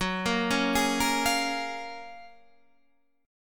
Gb Major 9th, down, Guitar, Standard; arpeggiated; JGuitar

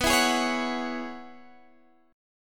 Gb 7th Suspended 2nd Suspended 4th B

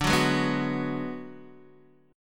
D 6th Flat 5th, Guitar Open D

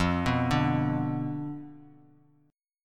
B Diminished F, guitar

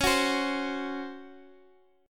Db Major 7th Suspended 4th; DbM7sus4; strummed; x,x,x,6,7,8

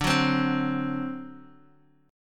D 7th D Guitar DADGAD

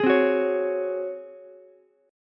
Ab 11th down Ukulele C6; strummed; JGuitar

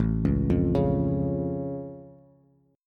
A Augmented A, Bass; chord

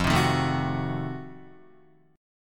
F 7th Flat 9th F down Guitar; F7b9 strummed

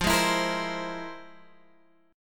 F Minor Major 11th, Guitar, Open G

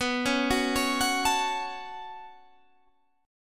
B 7th Suspended 2nd, Guitar, Standard; B7sus2; arpeggiated; JGuitar

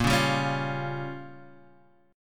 Bb Minor 6th, guitar; strummed; JGuitar

